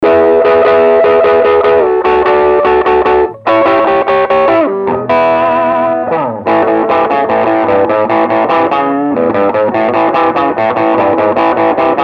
Tweed Gibson Clean, Clean Gibson amp mic'd with RCA 44A